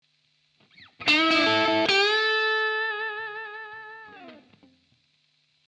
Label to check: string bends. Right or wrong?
Right